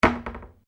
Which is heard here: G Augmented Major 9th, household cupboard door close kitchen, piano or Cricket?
household cupboard door close kitchen